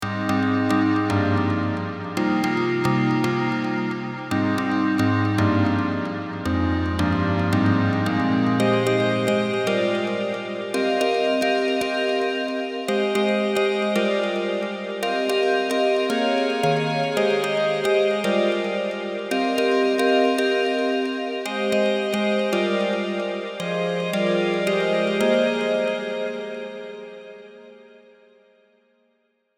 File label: Synth Bell